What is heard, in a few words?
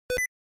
sticky keys sound